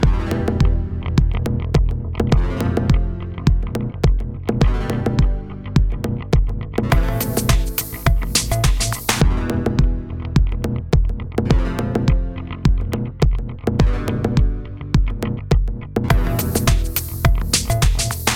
Soft Balls Loop

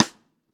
Rim Shot Closed

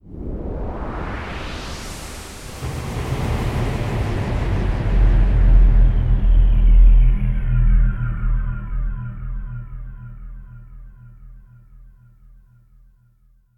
Solar Flare

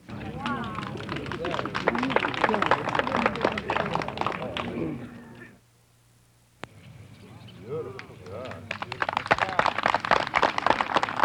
Golf Crowd Applauds

